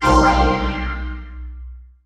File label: Pickup Magic; SFX Kit; Pickups